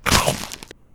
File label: Attack Eat